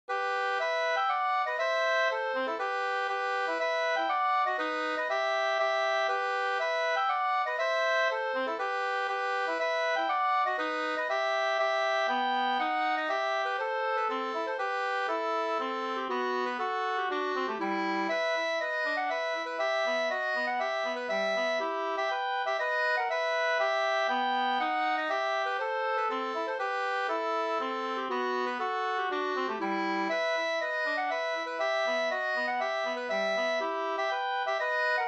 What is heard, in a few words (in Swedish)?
polska; fr Da Ahlstrom